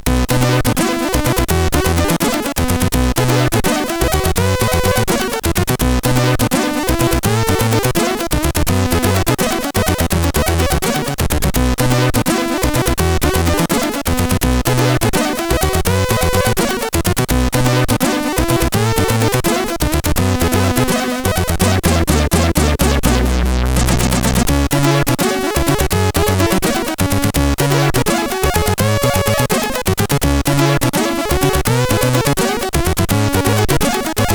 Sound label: Shoort Jazz, sid CSG8580R5